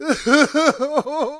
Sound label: crying